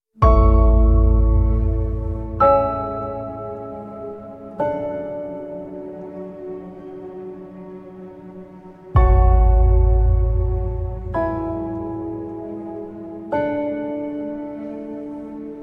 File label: Piano